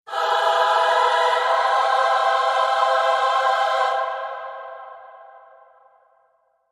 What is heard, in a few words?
E, Choir Only